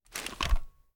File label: inspect; gmod